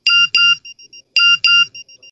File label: sms nokia